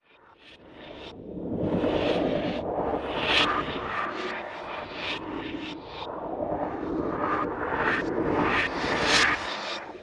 quantum state soundscape molecular; fvtt sonniss gdc audio

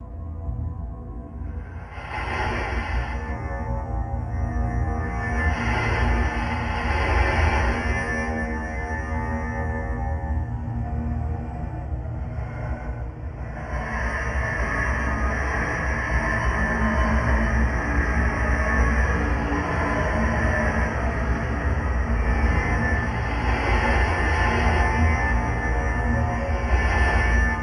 granular texture tension zither; fvtt sonniss gdc audio

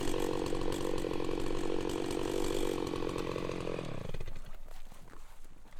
brushcutter engine off close mono; sonniss gdc

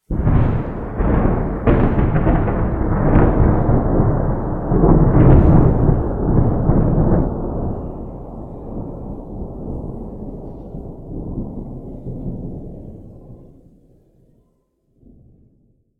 thunder powerful; sonniss gdc

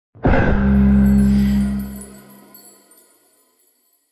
cure wounds, spells; 1st level spells; psfx

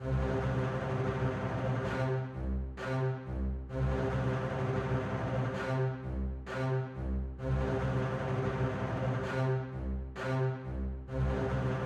Orchestra Bass; McRoMusic; Summoning Circle

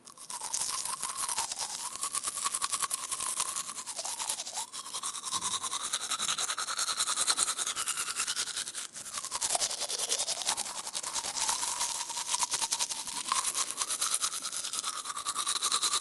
toothbrush basic; fvtt sonniss gdc audio

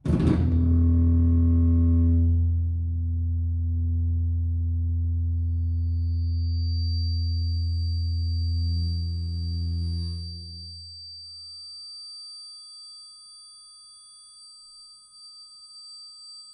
feedback long tone various; front ortf; sonniss gdc

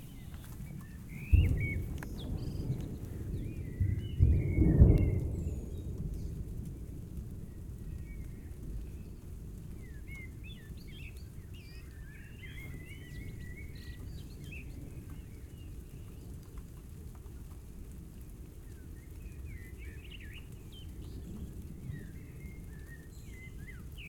thunderstorm distant blackbirds singing; sonniss gdc

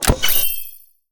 sci fi device item power up flash; sonniss gdc

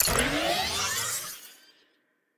Overcharge

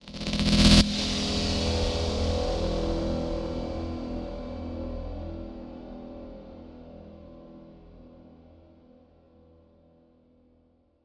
braam dystopian; sonniss gdc

